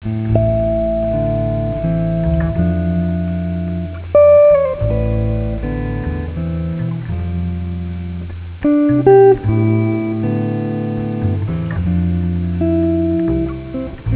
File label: sirens